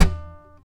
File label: TAP, FLAMENCO Guitars